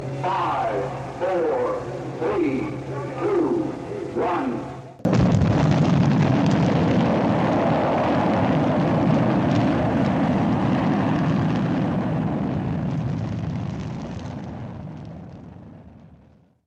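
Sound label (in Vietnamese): tiếng đếm ngược và bắn tên lửa âm thanh thực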